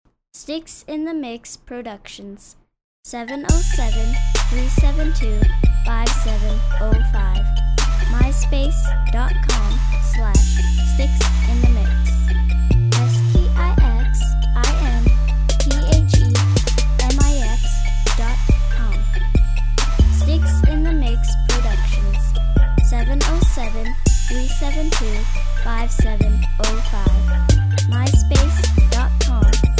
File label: glocks and bells